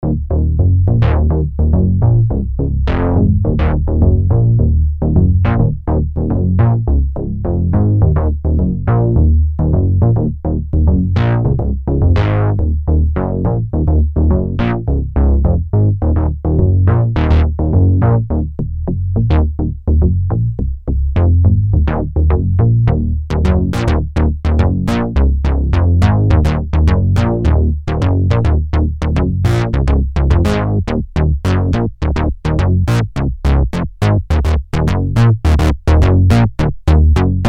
Bass; Sunsyn

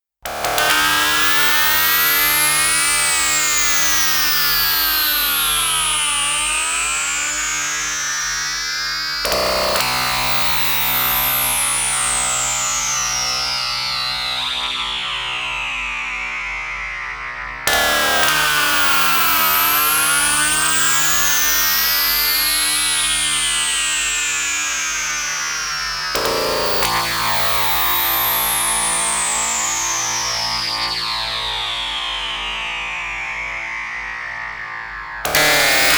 MemoryMoog FX

